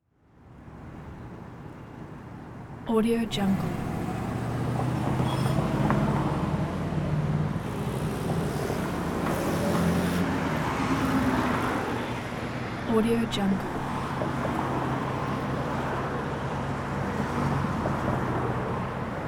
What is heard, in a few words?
traffic intersection steady incoming outgoing